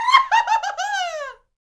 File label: Grito Ahaha!; Roland BK-5